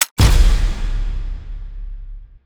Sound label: case awarded uncommon; Counter Strike Model Pack; CS:GO; panorama